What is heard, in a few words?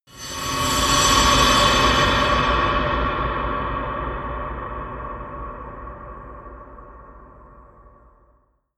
eerie industrial swell; sweeps-swells